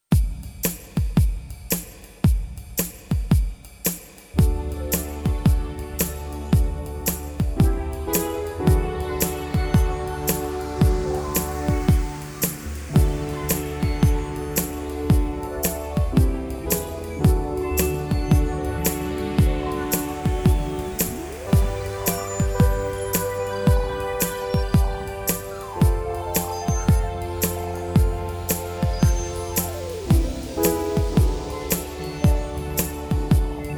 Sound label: Go Instrumental